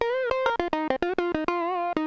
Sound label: solo